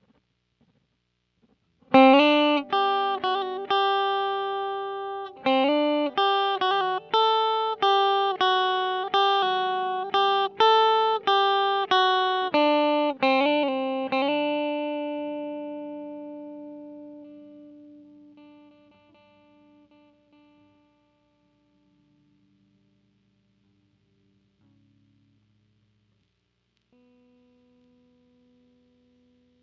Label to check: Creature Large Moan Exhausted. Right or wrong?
Wrong